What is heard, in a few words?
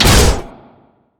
axe grab bump oh; Darius